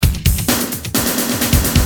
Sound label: Tribal, Drums Perc; Industrial